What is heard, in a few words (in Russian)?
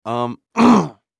zvuki pershenie v gorle kkhm